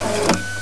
tisk

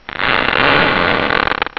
DVERE

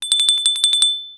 ZVONEK; Krtek

